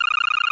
score count loop; gamescorescreen; Angry Birds Space

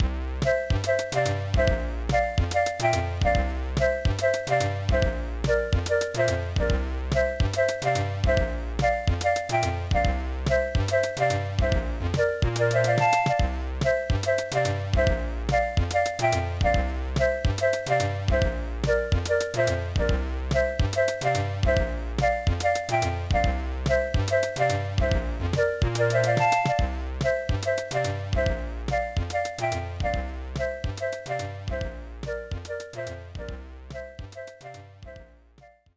BGM; majokko cream chan no gokko series 1 wannyan idol gakuen; gba

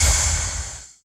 Wraith Recoil; Puzzle Chronicles; PS3; PSP; X360

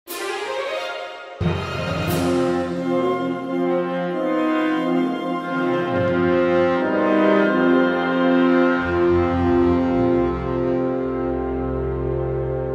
Retreat; Mount & Blade

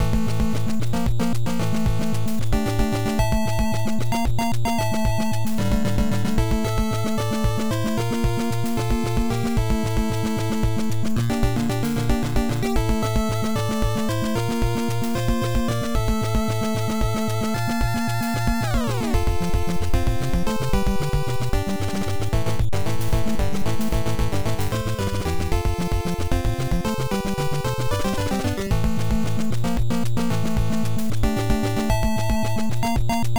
Boss BGM; Moai Stage Boss BGM; Konami Wai Wai World; Family Computer

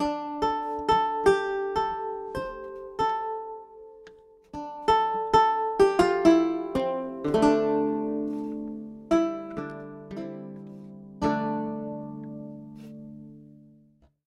Lute Dialog; gamerip; The Witcher 3 Wild Hunt

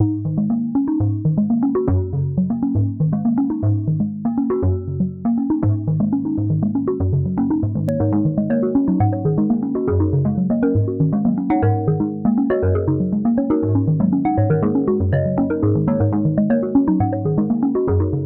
Marimbas; Cliffs

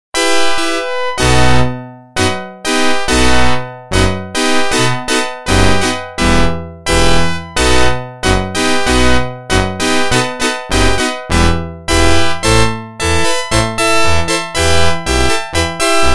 Organ; NHL 94

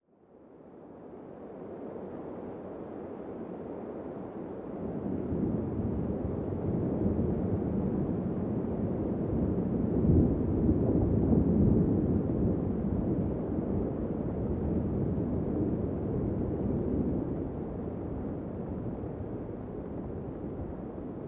anbience rain nearby Thunder random

